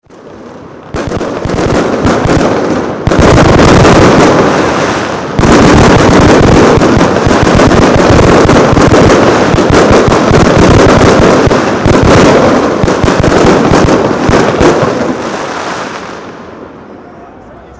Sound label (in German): Feuerwerkende und Sirenen